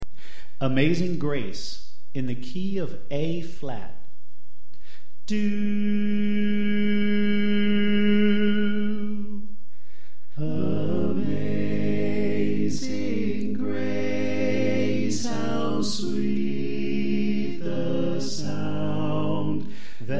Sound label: Tenor Left; Amazing Grace